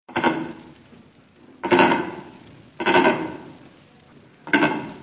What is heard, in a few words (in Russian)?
ptica sekretar sagittarius serpentarius